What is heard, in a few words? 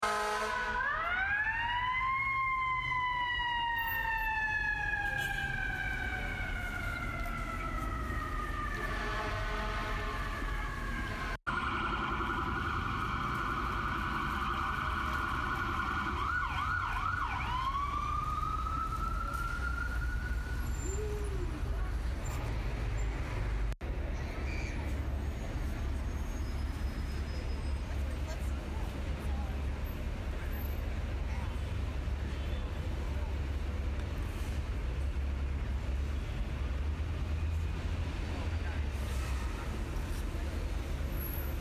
New York Ambience